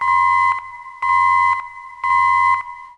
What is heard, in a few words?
flag drop; ctf; AssaultCube